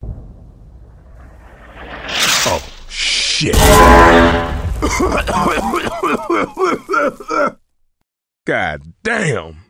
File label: mortar, gas